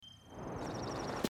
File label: falling; Zelda - Breath of the Wild